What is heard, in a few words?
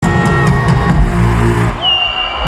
Chirp; Bitbird